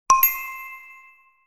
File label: notify